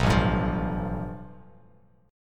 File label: A 7th Suspended 2nd Sharp 5th, Bass Standard; A7sus2#5 strummed; JGuitar